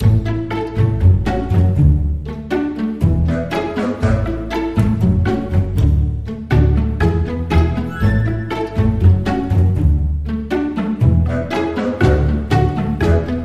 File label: Funny music